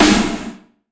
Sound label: soft hitclap; osu!